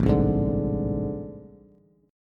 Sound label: A Minor Major 11th A, Bass, Bass Standard; AmM11 strummed; 5,3,6,7